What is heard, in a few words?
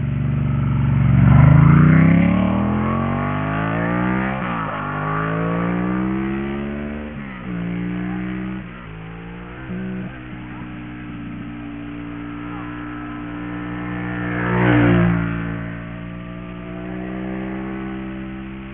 ducati